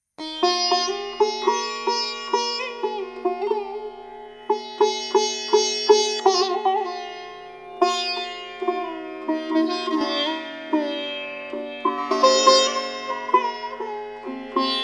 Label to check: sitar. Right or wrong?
Right